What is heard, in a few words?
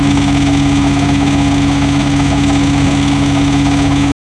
sport engine low rpm